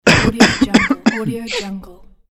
cough

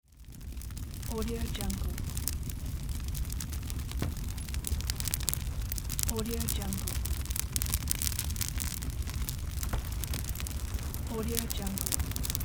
fireplace

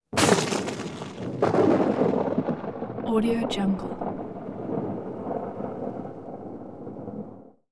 thunder sharp striking rumbling crackling

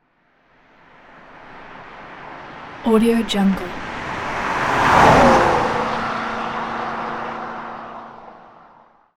camaro fast pass by; sound-ideas

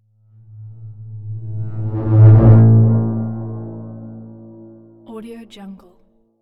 whoosh scifi

